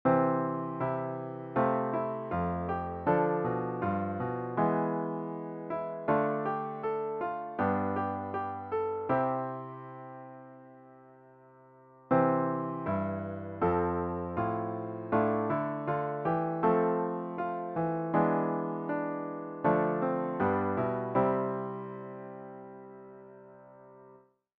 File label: Borrowed Dominant Melody